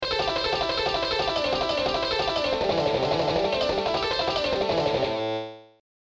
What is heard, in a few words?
Fast Sweep Solo Exercise; Sweep Excercises